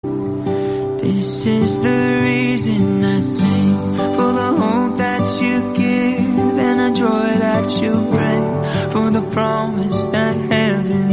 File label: english song